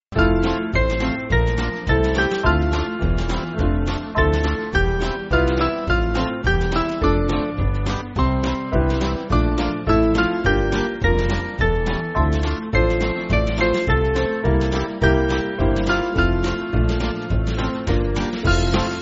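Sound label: Band, Music Backing; Hail Thee Festival; Salve Festa Dies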